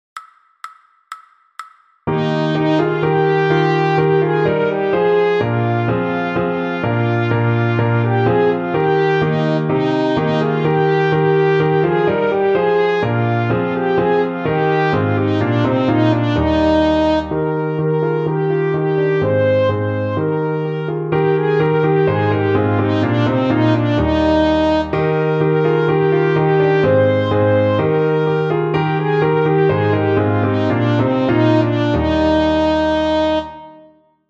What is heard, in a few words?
trumpet fast; Den VI Ronde